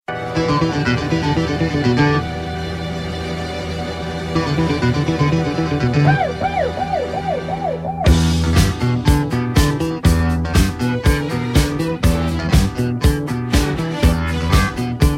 last guitar

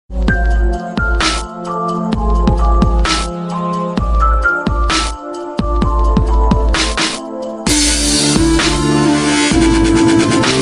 jingle bells dubstep

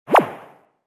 outgoing sms

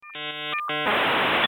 old modem

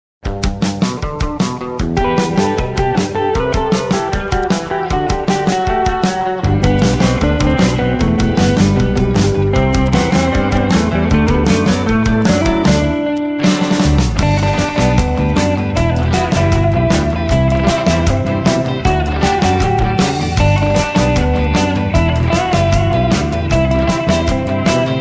guitar

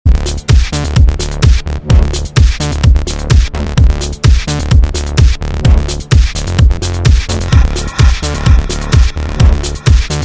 tecktonik music

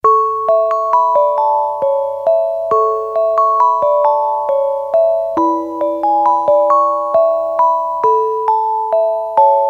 xmas musicbox

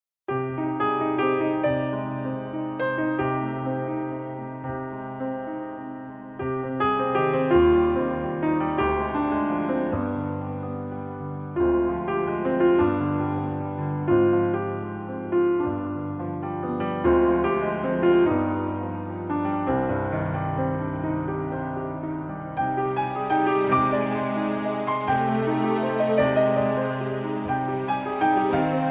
romantic piano

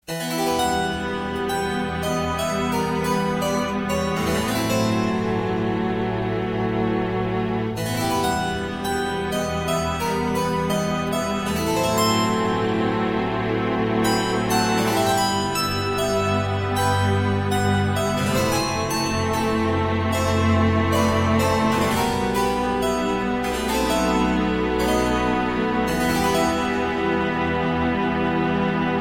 harp tone

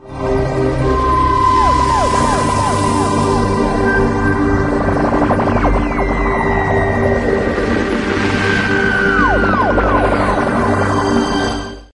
special sms tone

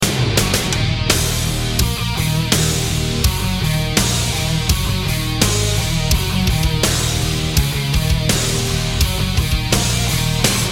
metal intro

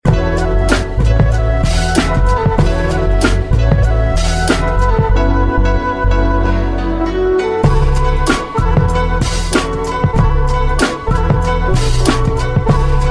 soft flute tone

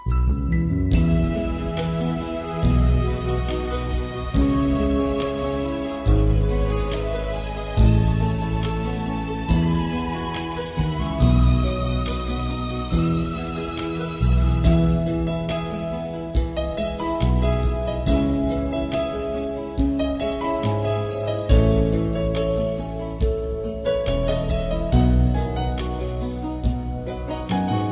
Piano

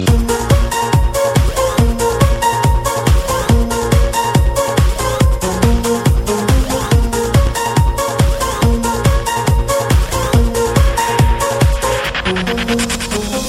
rocking dance

